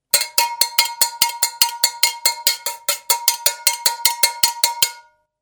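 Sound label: saucepan sound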